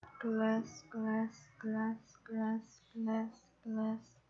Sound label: glass